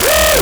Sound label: bass